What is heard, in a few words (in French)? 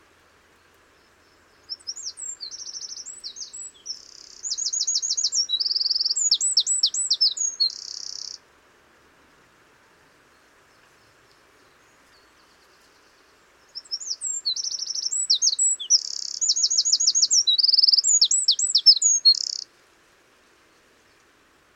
troglodyte mignon, Troglodyte mignon; enregistrés par Jacques Prévost de la LPO; chants d'oiseaux